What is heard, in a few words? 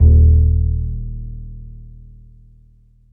PX ACOUS.D#1, Bass; Masterbits CLIMAX 7; PX BASSES